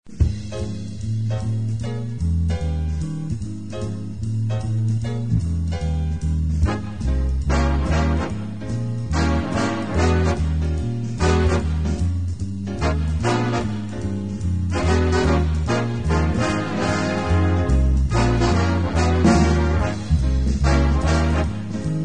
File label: Swing Amor Rumba